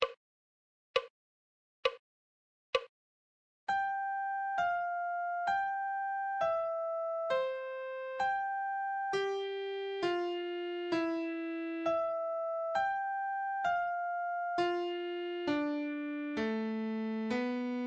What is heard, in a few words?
musical reading in treble clef Adagio